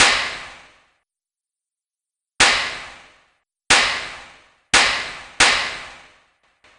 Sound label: clap